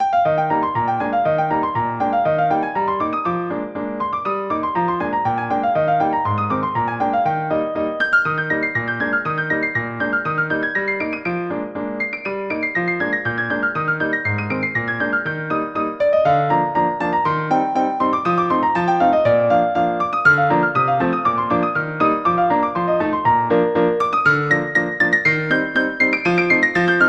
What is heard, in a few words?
Sailor music, synth